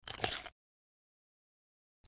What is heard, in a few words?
Juicy Crunchy Bites V, bone crush